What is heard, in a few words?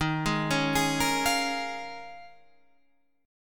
Eb 9th Suspended 4th, Guitar, Half Step Down; Eb9sus4